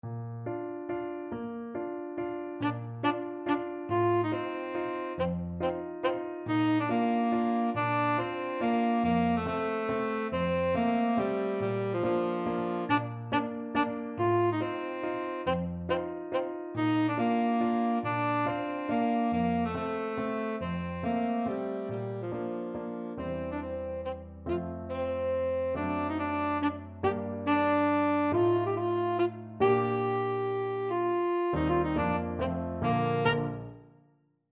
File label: tenor saxophone